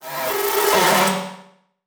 Transition Feedback Distorted; Adobe; Production Element

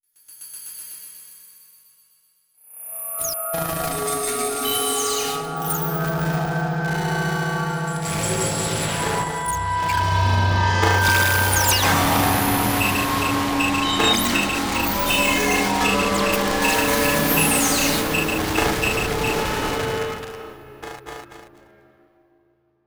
Digital Computer Beeps Static; Module Production Element Title Imaging

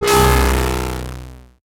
Title Transition Distortion

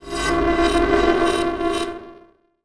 Transition Reverse Warble Reverse; Production Element; Title Transition; AdobeCC

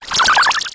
Digital; Multimedia Internet CD-Rom Flash

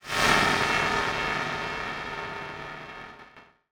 Transition Distortion Explosion; Production Element Title Transition

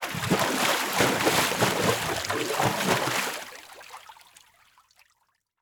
Liquid Water Water Splashing Movements

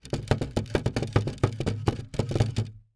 Household Wood Chair Rattle

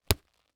Sports Boxing Gloves Hit

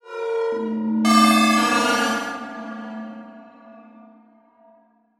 Transition Feedback Electronic; Production Element

